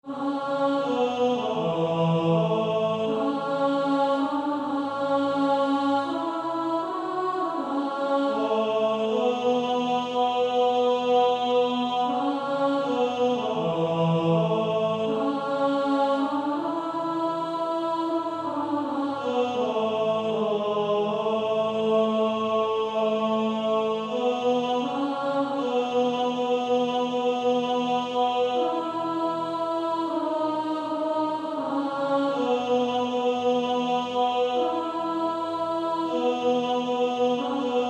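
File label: Male Choir, tenor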